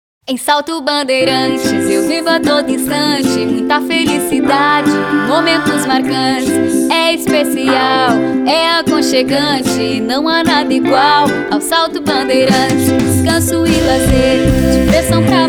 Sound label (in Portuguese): Jingle; Salto Bandeirantes Institucional